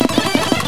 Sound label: Helicopter, SNES; Revolution X; NON Helicopter Pursuit